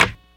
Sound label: Perc